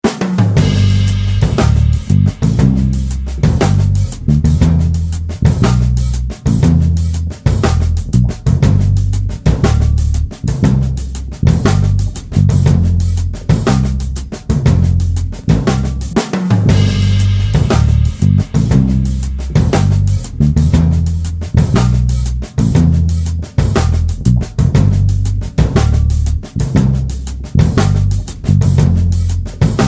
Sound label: Short notes